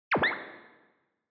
Button Click Reverb; Onoma

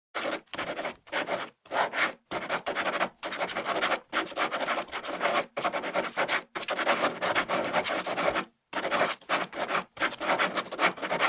Ballpoint Pen Write

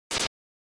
NES Fighting Swing

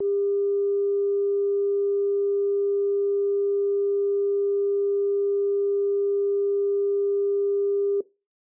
Telephone Signal Tone Dial

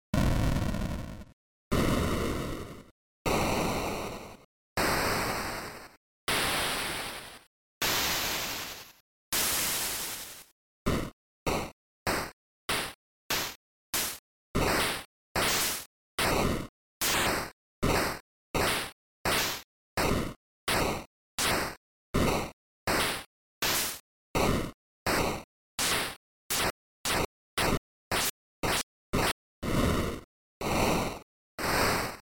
NES General

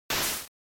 Noise Phrase, NES; NES-General

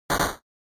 SNES RPG Attack Punch

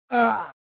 Fighting Scream; SNES

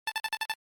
GB Action Message

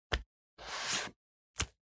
Liquid Glue Swipe

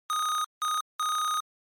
NES RPG Message

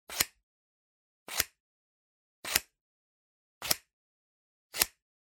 Scissors Cut Paper

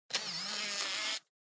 Camera Compact Zoom

